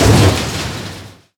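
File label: ambience industrial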